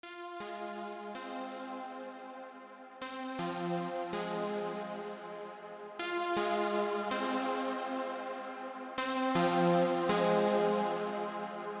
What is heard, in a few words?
phonk